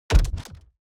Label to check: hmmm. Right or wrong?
Wrong